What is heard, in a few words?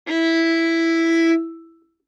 Violin arco ff sul G E4; MIS Pitches - 2014; Strings